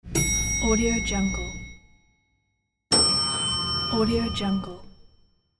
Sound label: lift bell